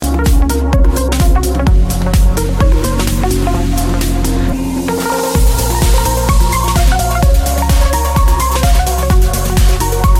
samsung mix ringtone

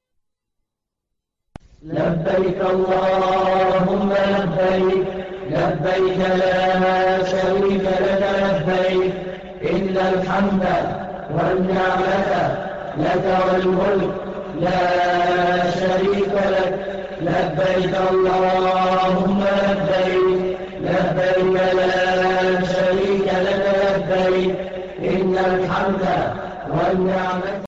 hajj islamic ringtone

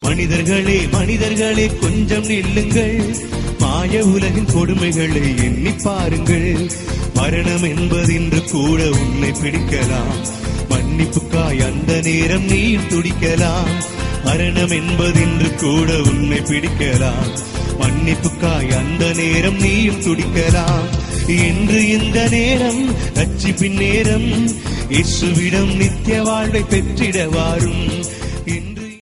gospel ringtone

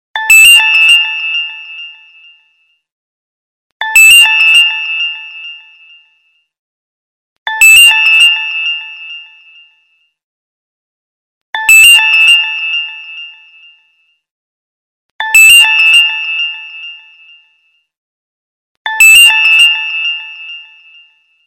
cool sound effect ringtone

